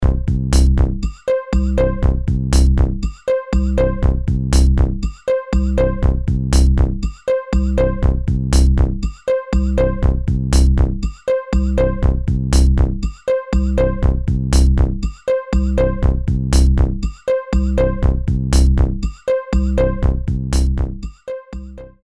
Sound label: Mixdown